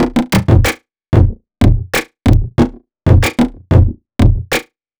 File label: Dirt Dry, Drum; Sonic Foundry (Sony Creative Software) - Future Retro Dance Excursions